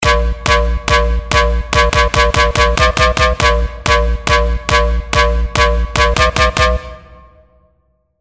FM SLAP

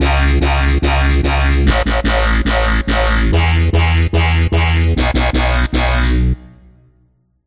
growler

